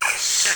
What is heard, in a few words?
Cloud Enemy Inhale